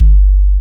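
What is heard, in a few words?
BD Sub, bass drum; Takebeat; Club; VEC1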